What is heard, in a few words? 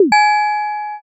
shishiodoshi